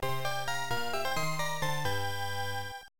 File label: GAMEOVER; QUARTH; KONAMI 1990